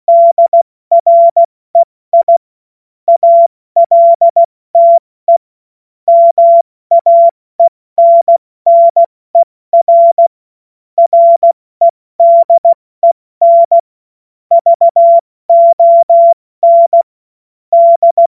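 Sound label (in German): Witz, cw morsen; Morsekurs; Witze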